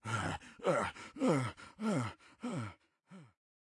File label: Guard Start Run Stumble Breathless